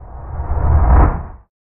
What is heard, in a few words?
Kraken Battle Tentacle